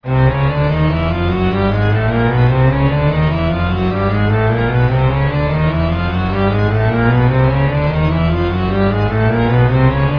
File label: shepard fast